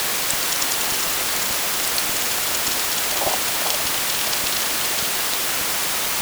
pond

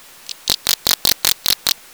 pond

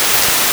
pond

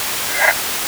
pond

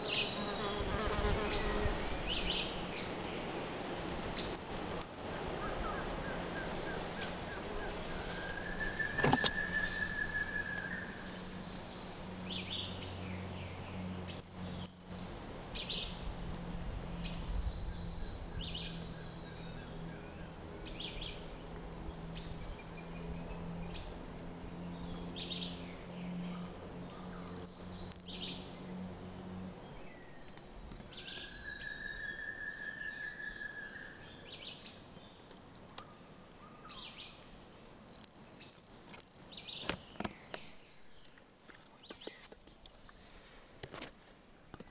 Brachycephalus sulfuratus Serra Agua Limpa; MRBornschein

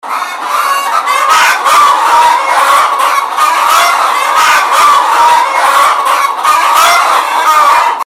Alarm call

